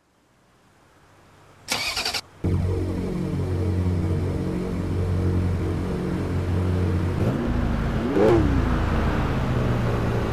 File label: ferrari 308 gts quattrovalvole; tdu